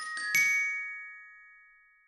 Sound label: Brush, notifications; Lenovo; ZUI 2